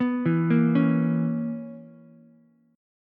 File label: B Major 7th Suspended 2nd Suspended 4th B down Ukulele; BM7sus2sus4; arpeggiated; JGuitar